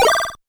Other attacks, 8bit; カタナ１

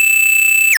laser, 8bit; カタナ１

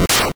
cut, attack sound; カタナ１

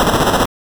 shoot, attack sound; カタナ１

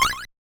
Other attacks, 8bit; カタナ１

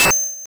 katana, attack sound; カタナ１

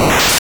cut, attack sound; カタナ１

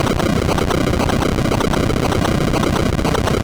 collapse, attack sound; カタナ１

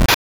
hit, attack sound; カタナ１

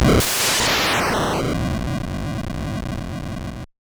finishing blow, attack sound; カタナ１

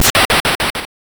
overthrow, attack sound; カタナ１

